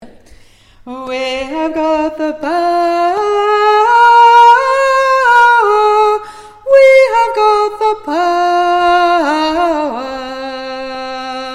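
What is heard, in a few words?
soprano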